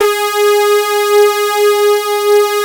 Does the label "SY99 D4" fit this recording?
Yes